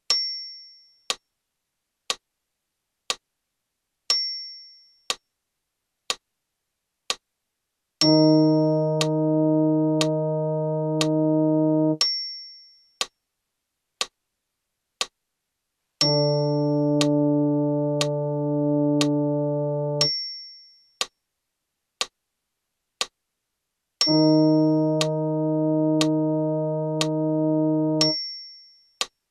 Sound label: Find in Eb, brass